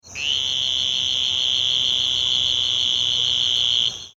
Western Chihuahuan Green Toad, Anaxyrus debilis insidior; southwest; amphibians